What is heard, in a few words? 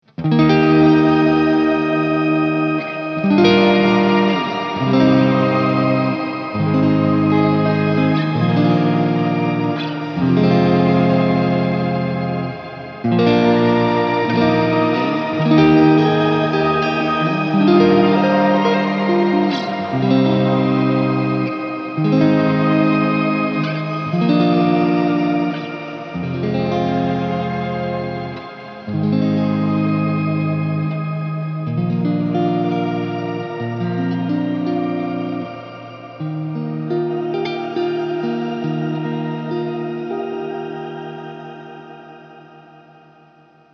hx shimmer